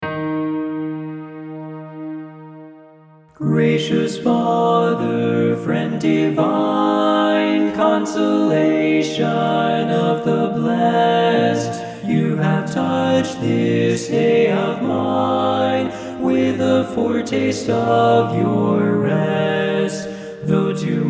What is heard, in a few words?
TENOR